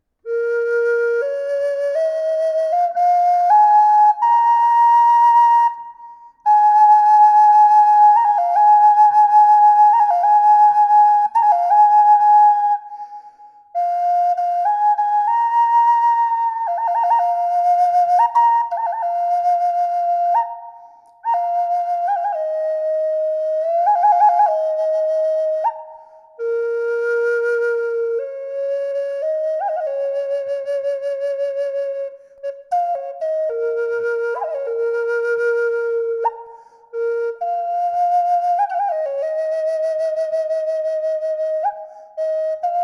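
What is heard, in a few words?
reverb, flute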